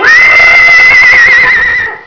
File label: Horse Snd; Sir Richard The Lion Hearted